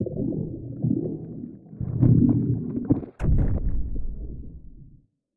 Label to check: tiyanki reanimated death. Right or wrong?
Right